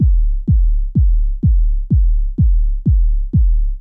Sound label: Hard 4 on the Floor